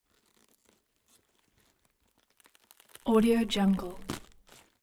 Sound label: gore slow rip; collanderson